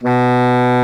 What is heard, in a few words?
BARI SAX B2; Greytsounds VOL-1